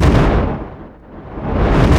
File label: splode; snds jack gmod